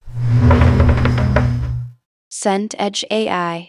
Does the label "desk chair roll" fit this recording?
Yes